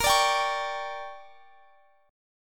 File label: D Major 7th Suspended 4th Sharp 5th